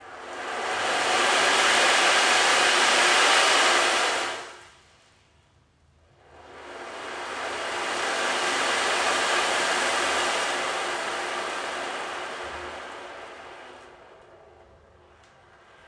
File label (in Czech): Buben more, mísy zvuky